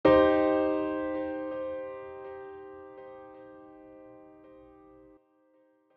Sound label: C Minor 1st Inversion